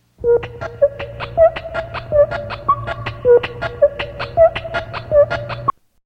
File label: Summer IDs Jingles